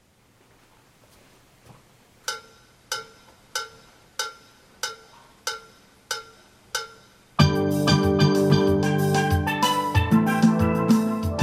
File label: Guitar